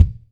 Kick Drum

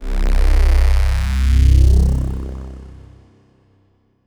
Resonant Stereo Pad C3

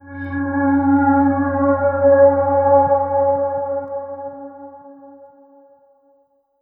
Kawai K5000W Mars C4

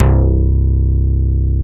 Ensoniq VFX-SD Slap Bass C1

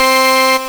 M-Audio Venom Bit Solo C4

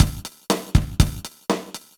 Yamaha V50 Rock Beat 120bpm

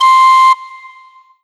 Korg TR Rack Tin Flute C6

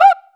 Cuica

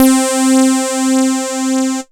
Roland JD 990 Saw Lead C7

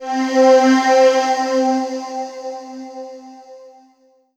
Kawai K1r Aah C4

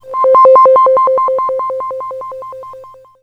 Yamaha PSS-280 Galaxy C5

